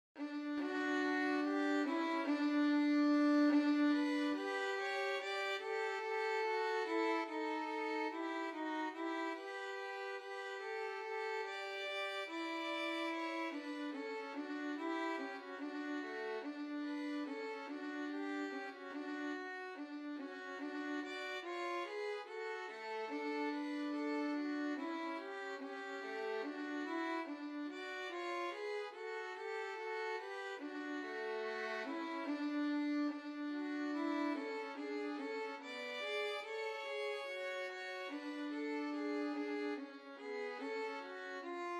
rest in the lord, violin duet

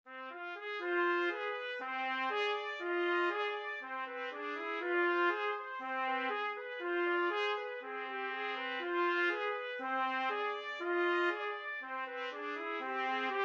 Odessa Bulgarish 2 trumpet; trumpet duet

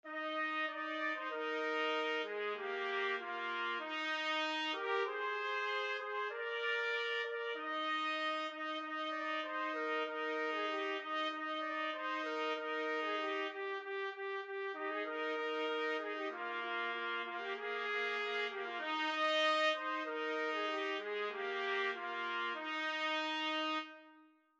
joy to the world trumpet duet

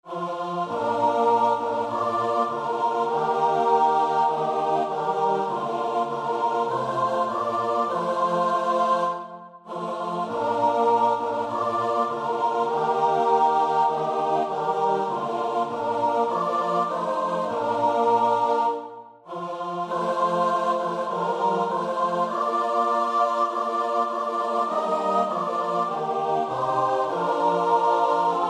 stand up for jesus CHOIR

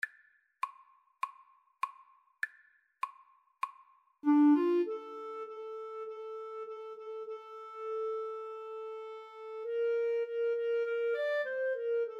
Clarinet Duet

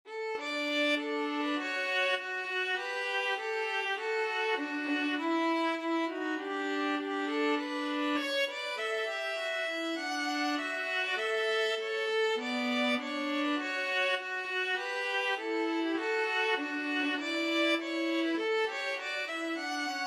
national anthem russia, violin viola duet

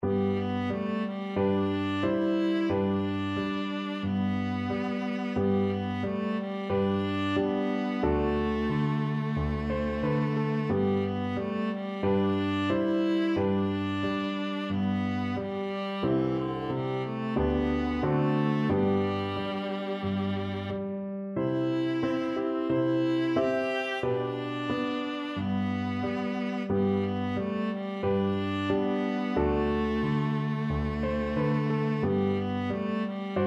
cradle, viola; skinner

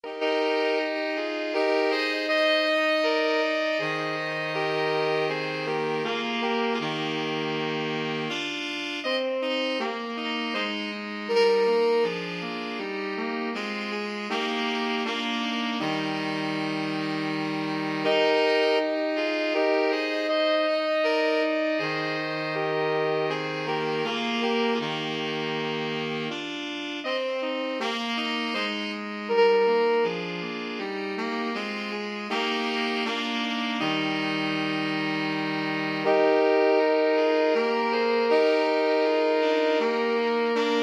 Dance of Blessed Spirits SAX

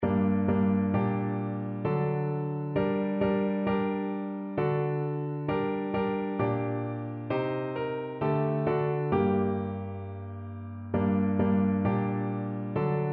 PNO

